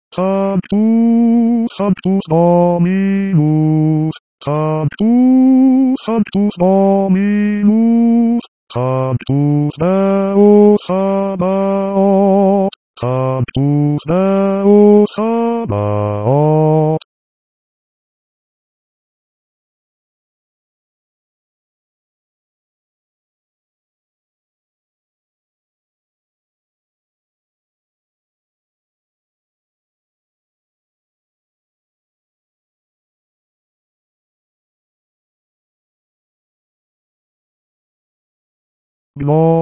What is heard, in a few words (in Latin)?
Santus Missa pro pace basses